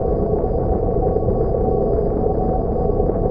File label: warp engine; Earth and Beyond; EA Games